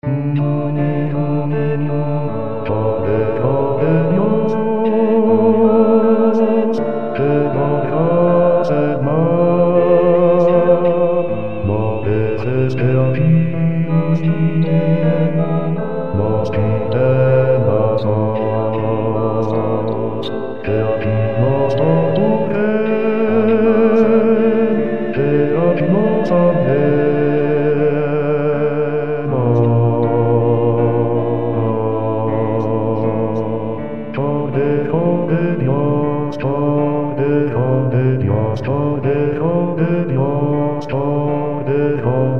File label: agnus bass